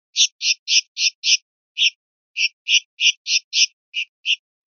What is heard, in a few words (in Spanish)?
rep cosqueos, pardillo